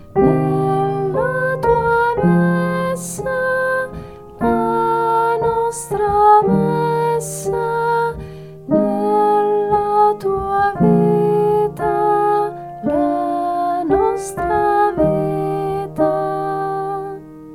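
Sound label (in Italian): Guarda Questa Offerta, tenori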